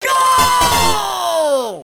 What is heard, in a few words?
Goal Player Victory; escape kids arcade gamerip 1991